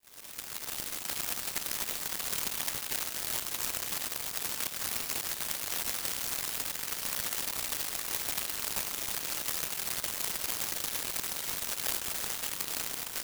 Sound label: rain on hard ground